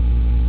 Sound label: shield